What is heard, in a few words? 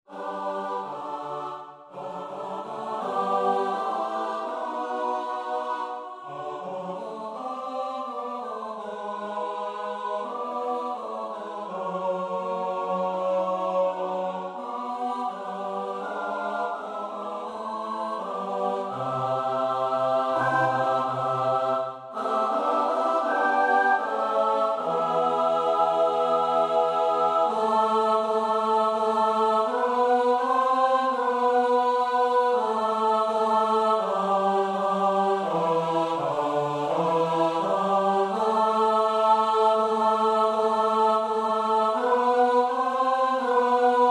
midi; jam jest szczep winny oltarz iv